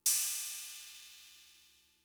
Cymbal; Fricke MFB-522

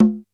HI CONGA, D110 DRUMS; Crypton - DrumBase5000

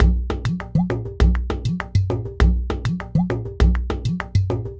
Ensemble, Latin Percussion

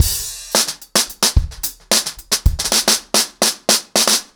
110BPM, acoustic drums, drumloops

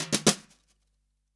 SD, REAL DRUMS, SWING KIT

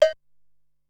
Agogo Bell, Drum Machines Ensoniq SQ-R-Plus; 300 Drum Machines

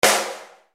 GUN-SHOT SNR, Yamaha RY-30

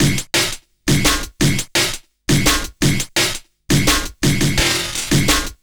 Hardloop, Drum & Bass; Fluid Dynamics

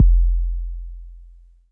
Kicks, Elektron MachineDrum; SPS1 MKII

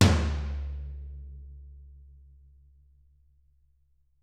TT, Toms; Bob Clearmountain Drums I

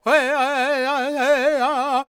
World Voices, Lakota

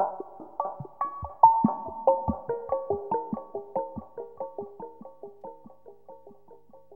SPACE, ATMOS

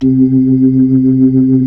B-3; Roland LCDP10 Keys of the 60s and 70s 2; Club Ch. Xfde

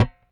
Rickenbacker Bass; Airwindows; Rick-Xtras

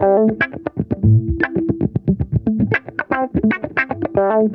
DIZZY, Funk Guitar; 90 s Sample CDs; Zero G

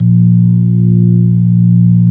ORG B3, organs; Sound & Vision; Gigapack I CD 2

